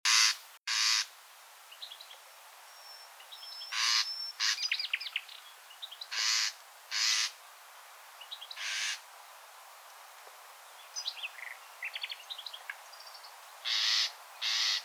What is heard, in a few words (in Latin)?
Luscinia megarhynchos; SETE